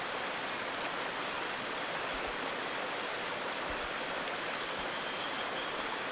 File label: Boophis kirki; Valohoaka